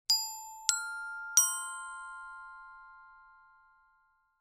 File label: PERCUSSION ORCHESTRA BELLS